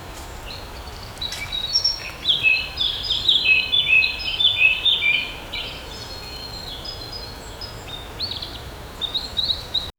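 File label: birds chirping in a forest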